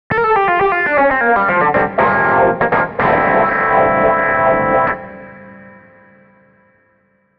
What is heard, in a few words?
ampsim; SONAR 2.0